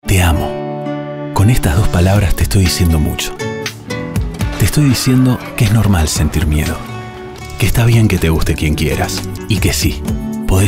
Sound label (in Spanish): comerciales tono emocional